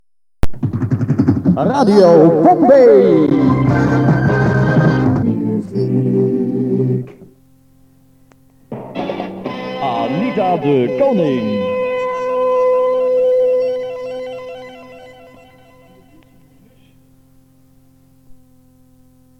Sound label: music